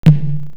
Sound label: DRUM